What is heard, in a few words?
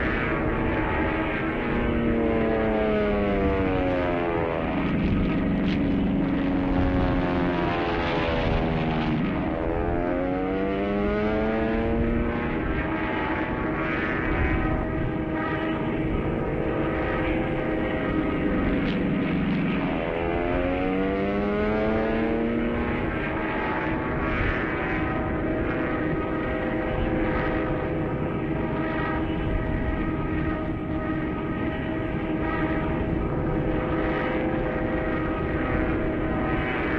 crashing plane, backgrounds; Secret Agent